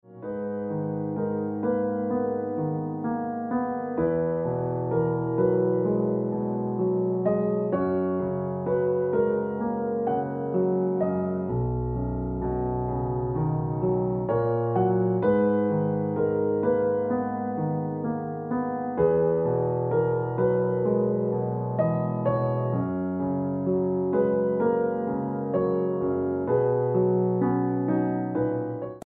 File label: Ringtone Piano Music